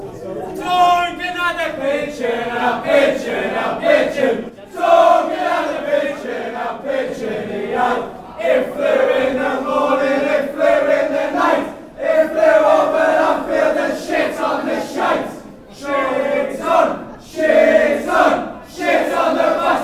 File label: duncans got a pigeon, fanchants